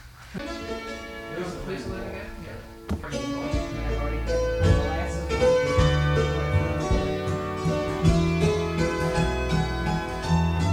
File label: Lisa Lynn waltz